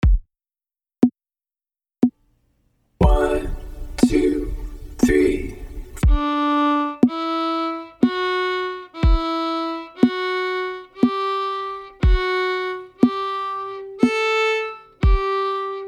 first four ascending steps, fiddle

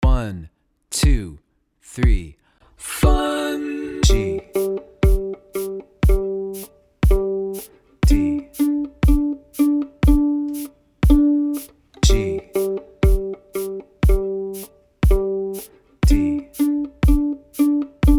Tucka G D, violin

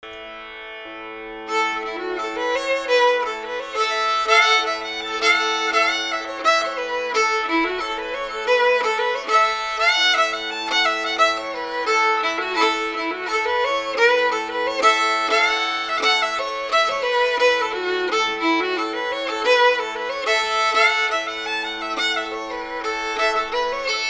old-timey kesh jig

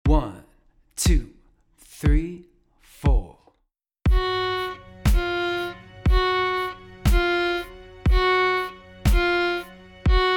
Vibrato, fiddle; art of fiddling

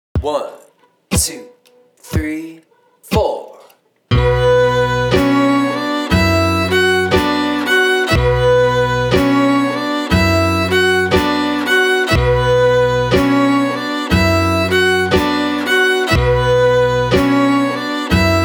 breton gavotte loop, fiddle; irish fiddle

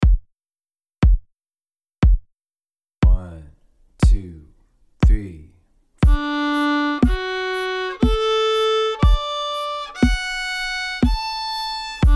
D triad two octave, fiddle; scale central

